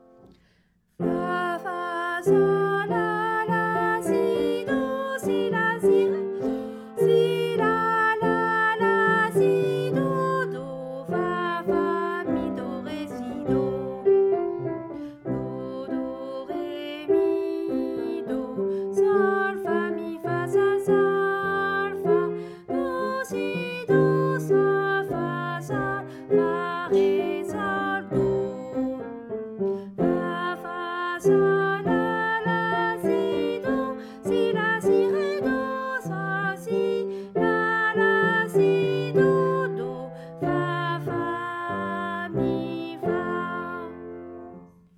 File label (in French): Arioso voix; evaluation fin de 1er cycle